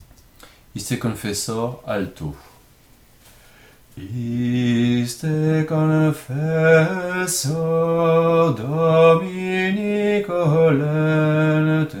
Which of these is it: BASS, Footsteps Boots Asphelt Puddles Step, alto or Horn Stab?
alto